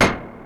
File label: Roland Sh-101